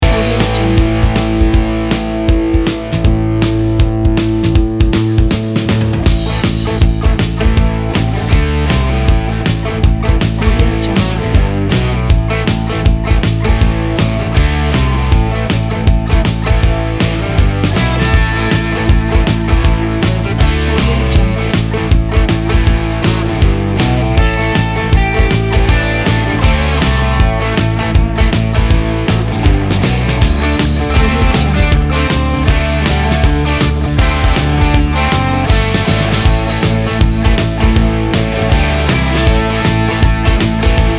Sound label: classic driving rock